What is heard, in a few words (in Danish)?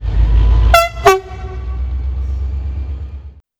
ME tyfon